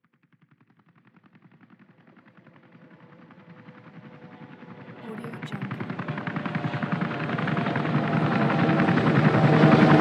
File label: helicopter group passing by